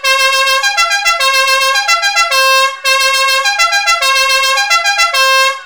fiamm m4 charge la carica novelty penumatic music horn trumpet bocina car van